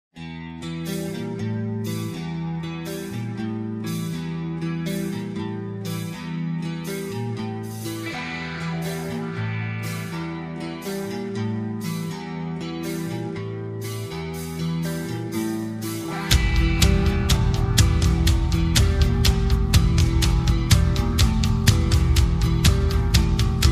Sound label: metal